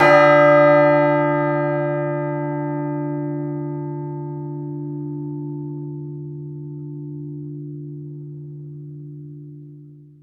BEL CHRCH, Roland; Roland - LA Composer vol. 1

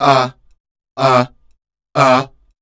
WEIRDOS, Voice Spectral

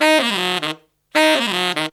160 BPM, Horns; Zero-G; Phantom Horns

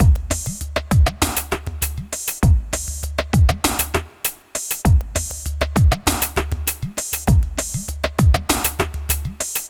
Ethnotronic, Processed Percussion

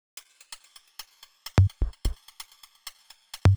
Drumloops Beat 128 BPM Perc Solo; VEC5 Drumloops; VEC5 Multi Loops; Loop Elements

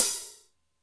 Cym Thrashy, Drum Machines Alesis D4

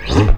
FAST UP, Electric Basses; PlatinumPhattCD2; E-MU Producer Series Vol. 8 – Platinum Phatt

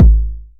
909 BD; Goldbaby Tape808 & Tape909; The Tape 909

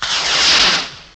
MISSILE; CMI Sound Library Rev 1.3; MACHINES

